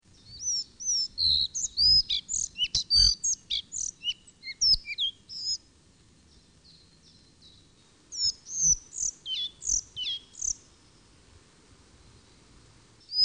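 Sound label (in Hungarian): Örvös légykapó; Madarak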